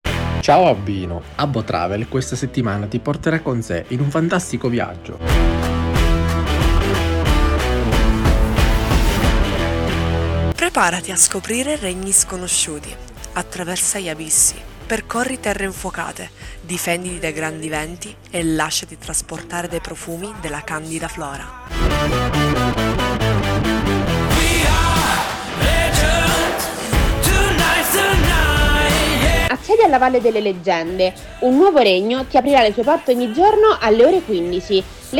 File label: Jingle